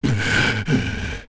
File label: Breathe; Ganondorf